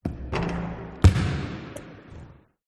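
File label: Basketball Miss Shot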